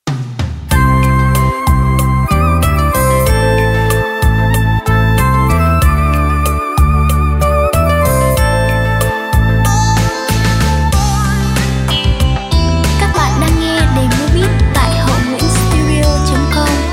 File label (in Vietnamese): beat